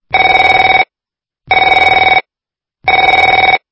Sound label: phone